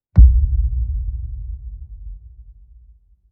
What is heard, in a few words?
Countdown